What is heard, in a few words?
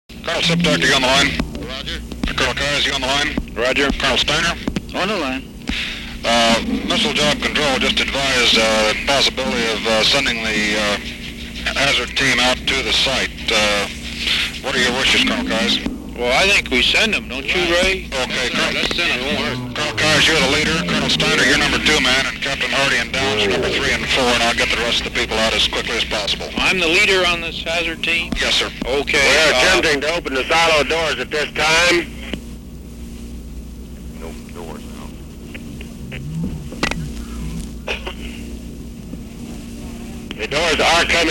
Silo Doors Open